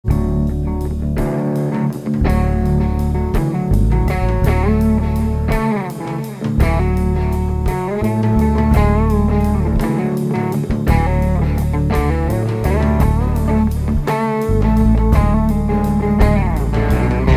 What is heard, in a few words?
Waltz; Lunas